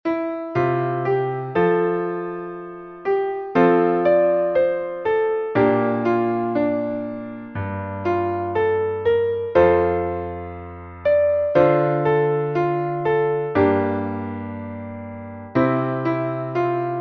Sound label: simple chords, piano; londonderry air